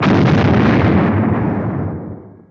xplode

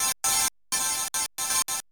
SYNTH PAD HOUSE 125 A; eJay; Sphere

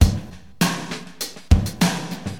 DM LOOP, HipHop, Drums

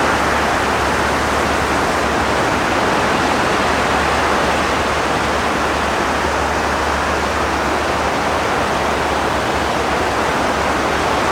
Wind Loop; kult

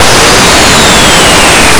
vibrate

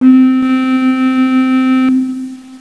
Recorder C4; Castles - Siege and Conquest

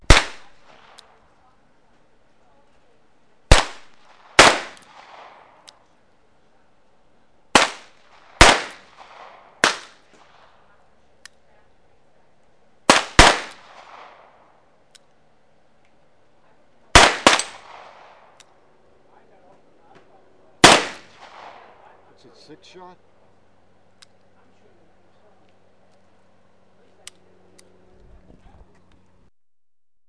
gunfire

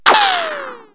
ball

